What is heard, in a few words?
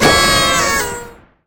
cannon confetti